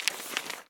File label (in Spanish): Pagina